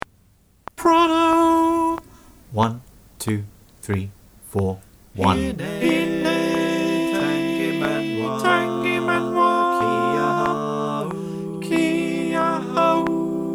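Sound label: click track soprano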